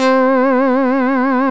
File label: Hold For Funk, Synth Lead; Ableton; Factory Packs; Synth Essentials; Analog